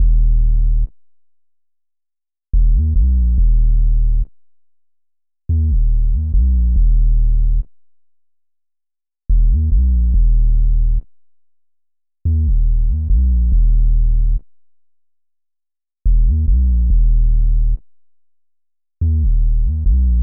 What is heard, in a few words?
142 BPM D# Min Bass; Cymatics; Can't Die